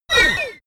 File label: sci-fi laser shot